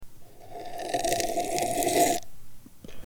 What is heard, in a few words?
Slurping soda